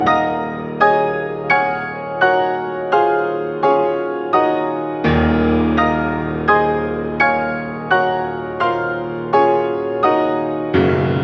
heart; Manamon Music; Manamon 2

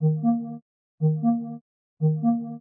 loading; chromevox

